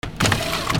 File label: OFFICE